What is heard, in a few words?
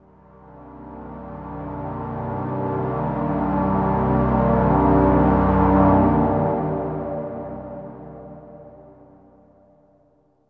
synth pad ambient 160 C; Sphere